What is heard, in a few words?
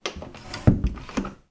household door wooden internal shut; sound effects one